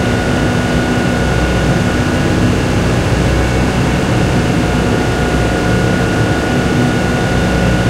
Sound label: hetzer engine int rpm 99, tanks; War Thunder